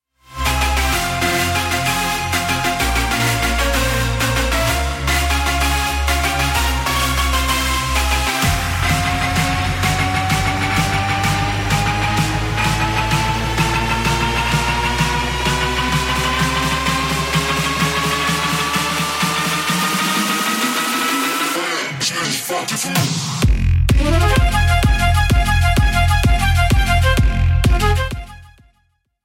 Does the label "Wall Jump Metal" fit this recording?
No